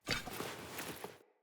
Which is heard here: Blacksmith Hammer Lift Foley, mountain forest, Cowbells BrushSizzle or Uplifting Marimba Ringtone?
Blacksmith Hammer Lift Foley